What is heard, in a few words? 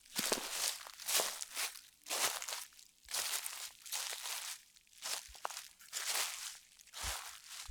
Steps Loop, outdoor, Footsteps